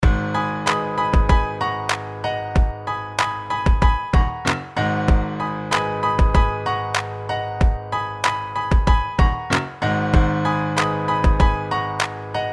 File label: Swift Hop Loop